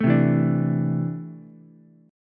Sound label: C 6th Add 9th C down Ukulele; strummed; JGuitar